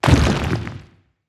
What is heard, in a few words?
stone building destroy; esmteam